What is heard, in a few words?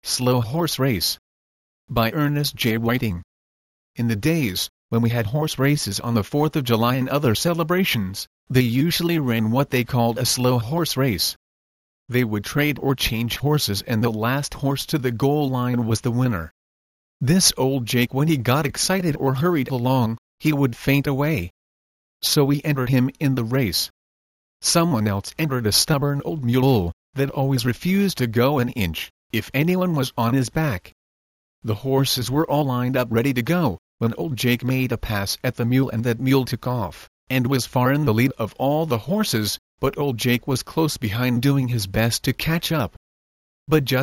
Slow Horse Race